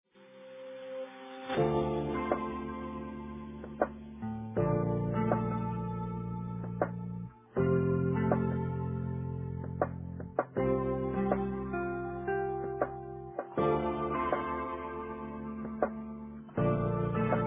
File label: bgmusic